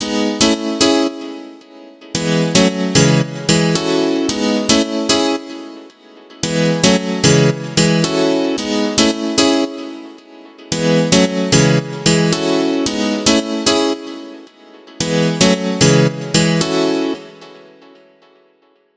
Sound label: Drop Piano G-m Tail